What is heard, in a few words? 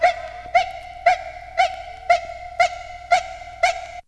VFX; ZERO-G; Datafile